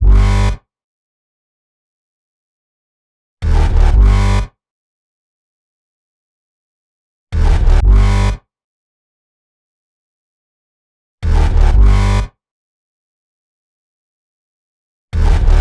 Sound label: 123 B Bass, Complex Electro Synths; Prime Loops; Impulse